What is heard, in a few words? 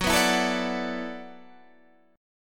E 7th Suspended 2nd Suspended 4th, Gb, chord